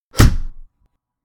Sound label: Layered Punch Sound Effect